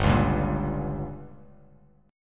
G Diminished

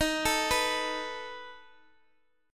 A Suspended 2nd Flat 5th

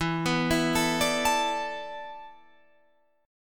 E 7th Suspended 4th E down Guitar Open F; E7sus4; arpeggiated

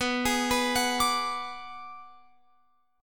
B Minor 6th B, Guitar Open F; Bm6 arpeggiated

